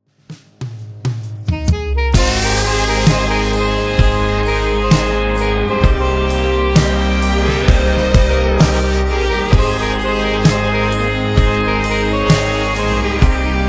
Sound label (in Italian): Groove Continuo; Sanremo